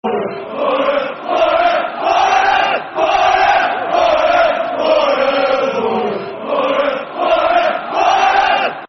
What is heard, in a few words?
Boro, Boro, Football Chants; Middlesbrough; Middlesbrough Mfc